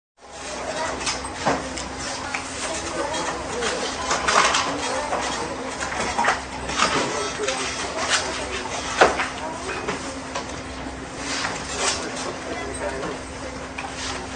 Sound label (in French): Ambiance supermarché; bruitage